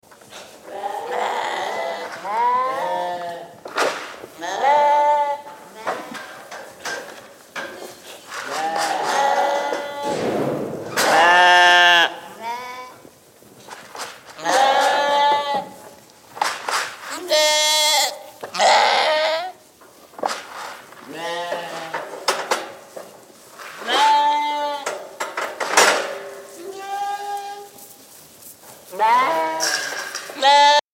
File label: bleating lambs